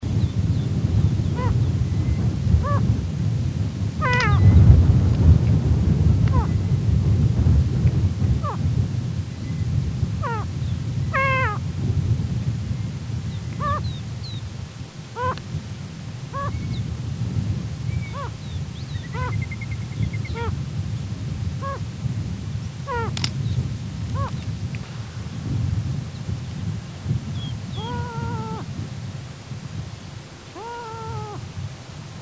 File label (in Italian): Pernice bianca